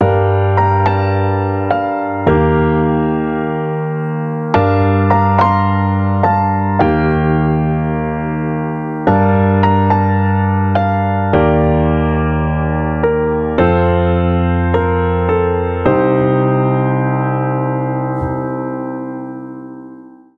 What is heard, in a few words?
Emotional Melody B Maj G Min 106 BPM, piano; nikos piano sample pack